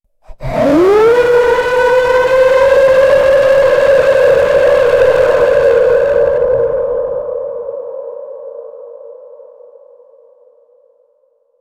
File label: the cry of a space, black hole